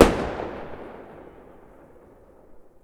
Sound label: firework pop